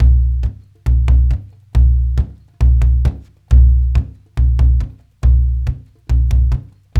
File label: 138, African Ethnic Drums; Spectrasonics; Supreme Beats